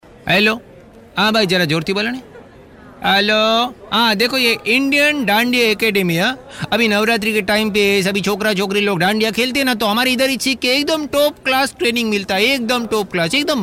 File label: Dandia Gujju Style Caller Tune; Dandia Academy